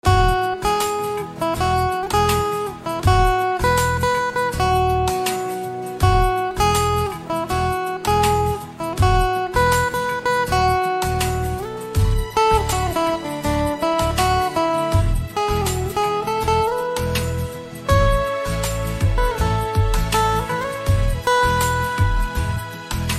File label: Guitar Instrumental Ringtone